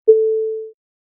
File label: Braille Chord Clock Tick; Business skieme Beta 1